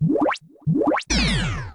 Minimize; Pokemon Essentials; Pokemon Iberia V1.03

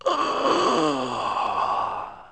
Yawn; ScummVM; Simon the Sorcerer Puzzle Pack (CD Windows)

